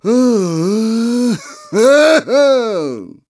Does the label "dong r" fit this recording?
No